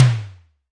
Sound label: noise tom; Western and Latin Percussion; single hits